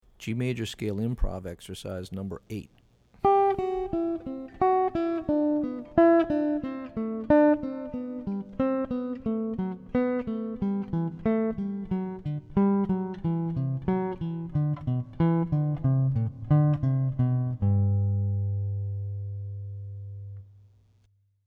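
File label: G Major Scale Improv Exercises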